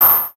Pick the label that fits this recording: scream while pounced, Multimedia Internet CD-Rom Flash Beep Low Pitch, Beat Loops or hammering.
Multimedia Internet CD-Rom Flash Beep Low Pitch